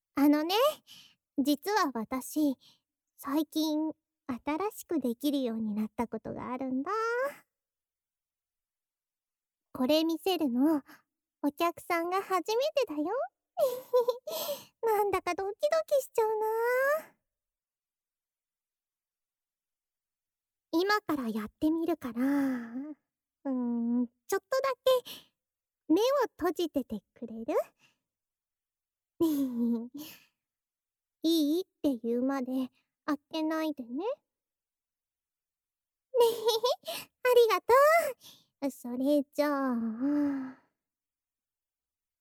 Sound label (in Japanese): スライム娘の分裂耳舐め 右